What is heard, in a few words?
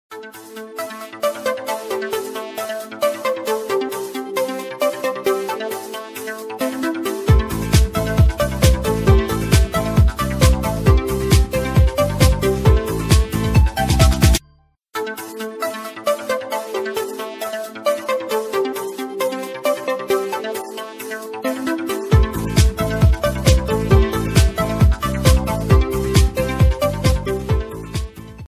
romantic ringtone; apple